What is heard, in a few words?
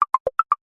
multimedia notification subtle ui clicks pattern